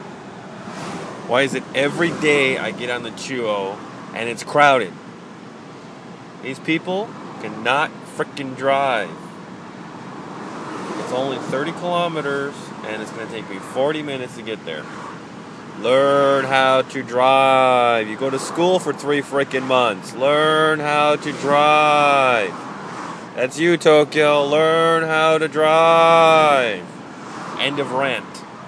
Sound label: Tokyo drivers sigh